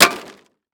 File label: Impact Wood Crash Short; Impacts